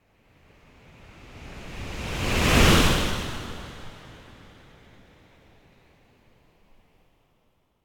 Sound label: Wave By Strong, Fast And Deep Wave - Passing L to R Wave Whoosh; Hollywood Edge; sound designer's toolkit v2